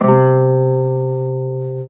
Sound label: C near the bridge, timbre